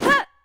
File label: Jump vox